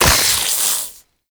Modular Secondary Barrel Beam Attach, Weapons; SU Modular Guns; Barrels

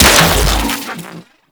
Shock Rifle 3D Fire, Weapons; Warframe; Corpus

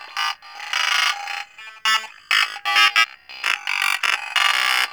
Mining Laser Ring Loop; Warframe; Eidolon Mining Lasers

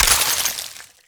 Ammo Pickup Cold; Ammo Ability; Warframe; Warframes; Frumentarius

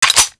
Viper Clip Out; Warframe; Weapons; Vipers

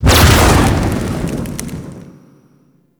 Flamethrower Fire 2D; Weapons

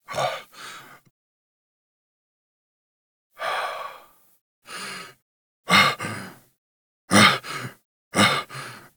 Breaths; Kahl; Warframe; The New War; Cinematics; Grineer Eidolon Ending

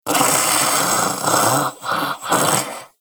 Armadillo Vocal Snore; Warframe; Characters; Hunting Critters

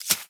Micro Step Snow; Warframe; Corpus; Arachnoid Micro

